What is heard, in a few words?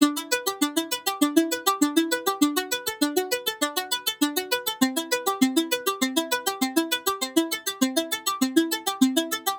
Arp Loop 100 BPM E Min, Future Bass, Synths